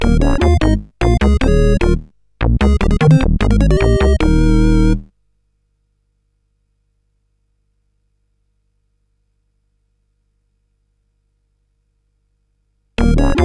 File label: Game Music, sid CSG8580R5